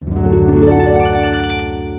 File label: chimes